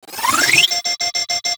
Shield Lancer Pistol Alt Charge; Warframe; Lasrian Soldiers; Weapons

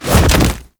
Knee Drop; Cinematics; Archon Death

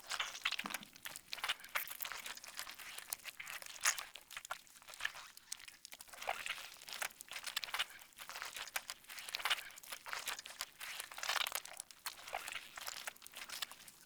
Infested Goop Slime Area Loop; Ambience; Props; Warframe; Infested Eris

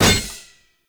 Stadium Cymbal Destroy; Warframe; Ambience; Nineteen Ninety Nine

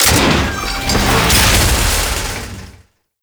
Outpost Prisoner Cage Open; Warframe; The New War; Narmer Outpost

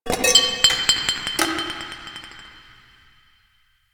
Subway Garbage Ambience; Warframe; Nineteen Ninety Nine

